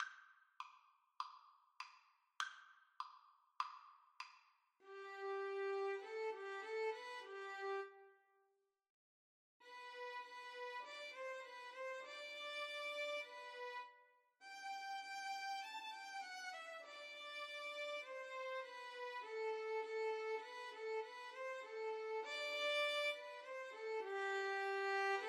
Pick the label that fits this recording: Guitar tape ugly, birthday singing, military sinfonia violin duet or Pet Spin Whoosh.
military sinfonia violin duet